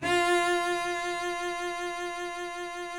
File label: SOLO CELLO F3; Greytsounds VOL-1